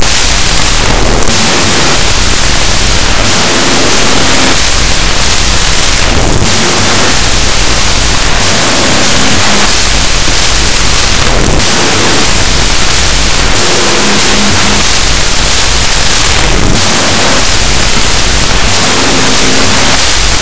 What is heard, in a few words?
Rain Room; lolicore